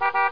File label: beep; TRAFFIC2